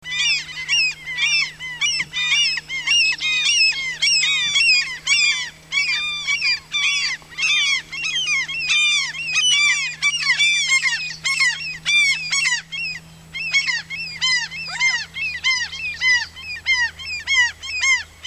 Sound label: L canus worrying